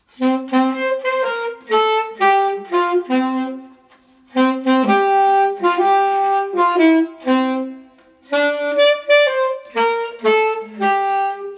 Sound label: Altsaxophon